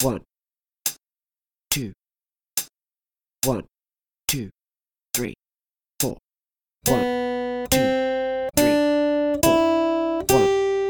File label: 3 strings, guitar